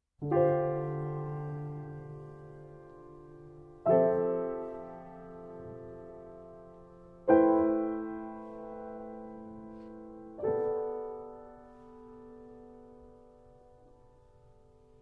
Instrumental Version

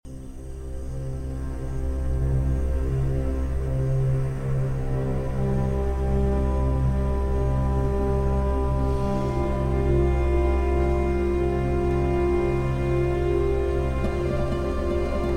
Concerto For Guitar and Strings in G Minor; Arr. From Flute Concerto in G

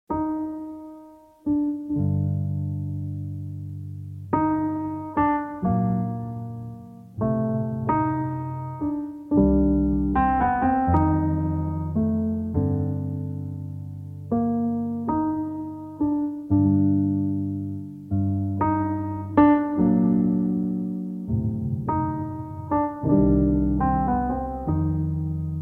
Instrumental

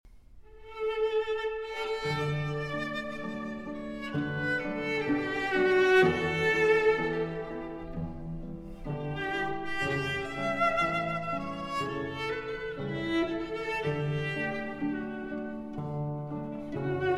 Quartet in A Minor, Adagio Cantabile

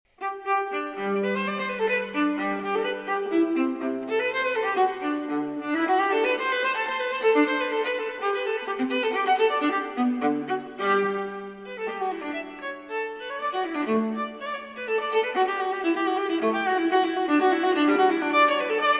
Cello Suite No. 1 in G Major, for Viola, Courante; J.S. Bach; BWV 1007

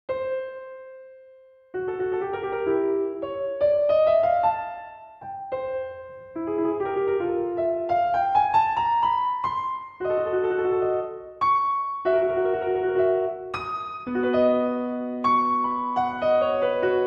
Solo Piano; Carmen; Act 3 Selections (Part III)

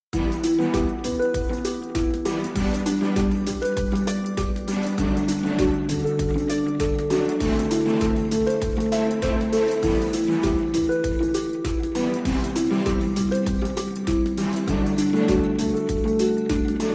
Steampunk Music

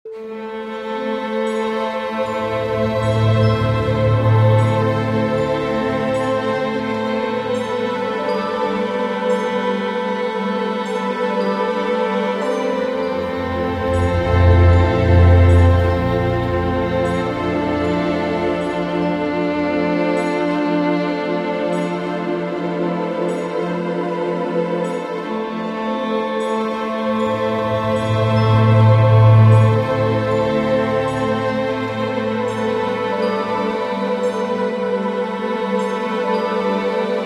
Violin Particle Drone

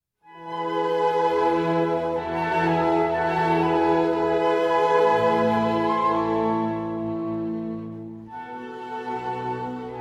Adagio in E Major for Violin and Orchestra

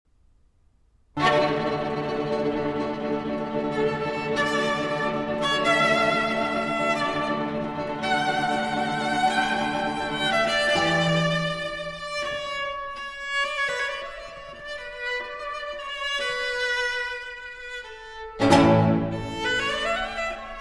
Quartet in A Minor III. Recitativo

